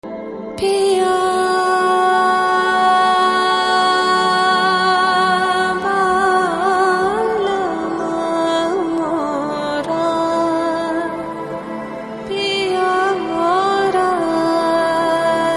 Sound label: Flashback Ringtone